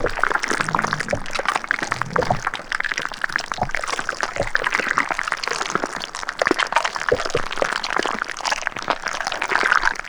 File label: Spider Trap Grab Loop; Lurker; Evolve; Gorgon